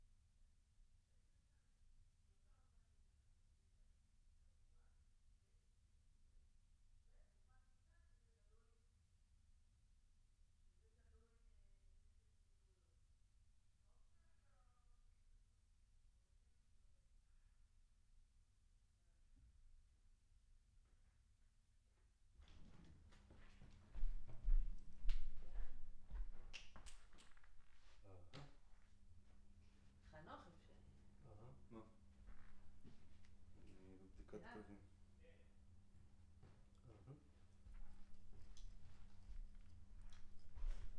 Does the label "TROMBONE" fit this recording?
Yes